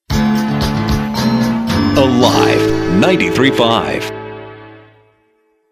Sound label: Weather Out